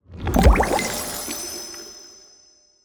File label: Potion; Minora-Game; Sound-Artlist